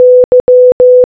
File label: Y Morse Code Audio